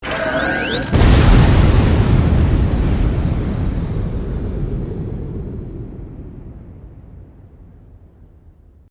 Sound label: robot explode